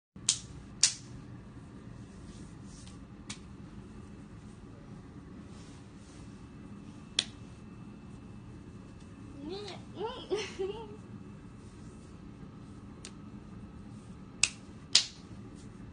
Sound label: ketsutataki